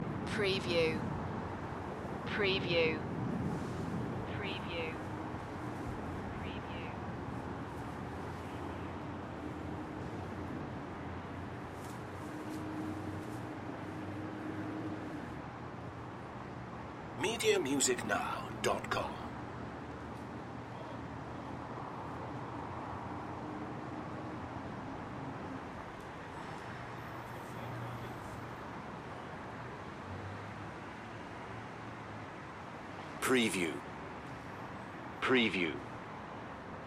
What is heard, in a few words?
Urban Background Lax Short